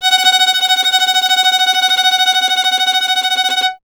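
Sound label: string violin tremolo; Miroslav Vitous; Solo Instruments VOL-2; Solo lnst. II; Vln Trem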